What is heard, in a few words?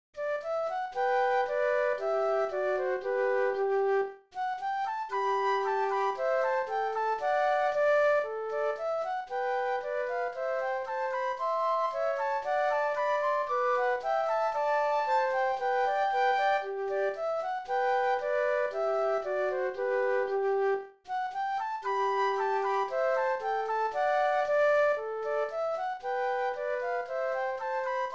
Air Flute Duet; classical; Clarke